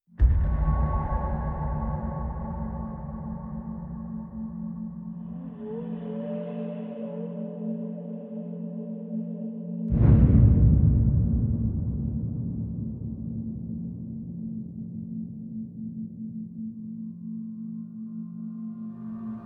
pads and fx

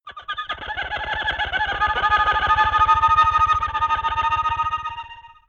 snaking rattle

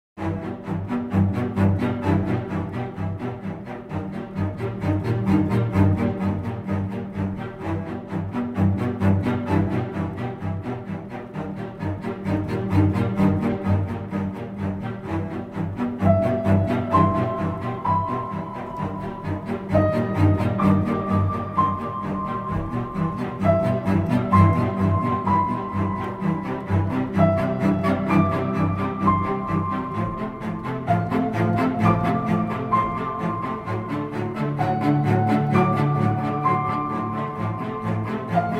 strings and piano

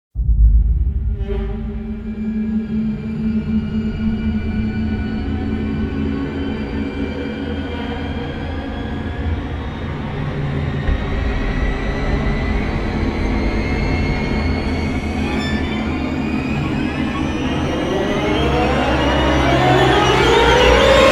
no choir

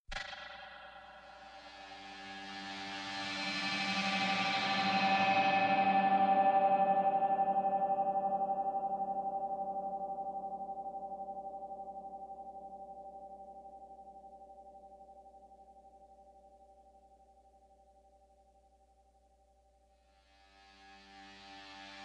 distortion

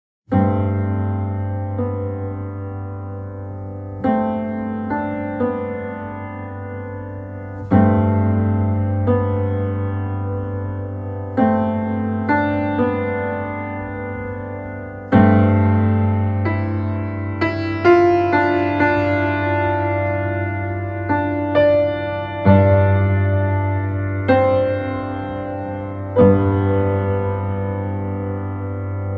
piano and ambience

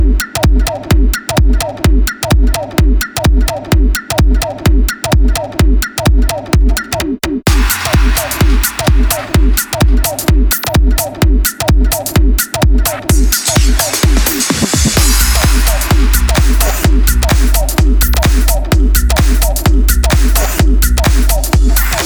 sax touch remix

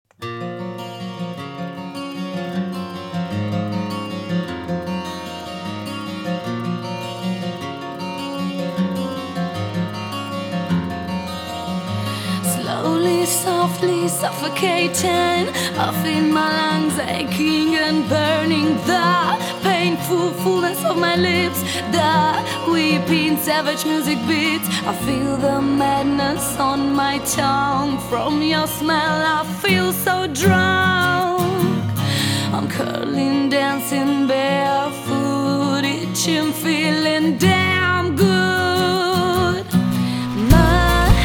acoustic

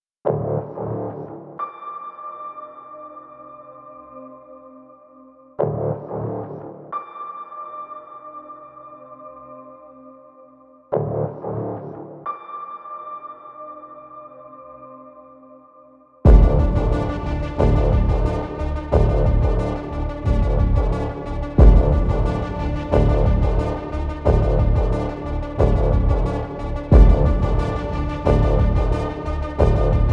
decimator instrumental

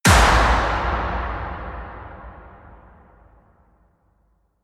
hit big catapult; brand x music

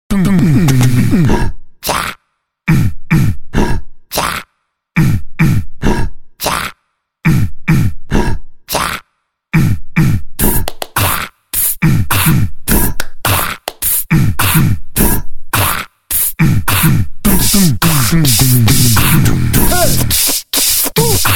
human beat box

